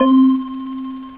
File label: jahr bell low; OctaMED SoundStudio V1; instruments